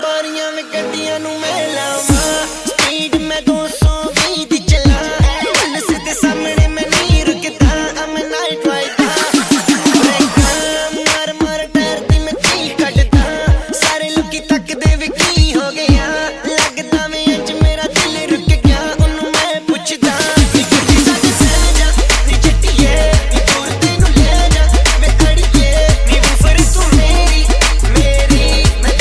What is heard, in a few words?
Dhol Mix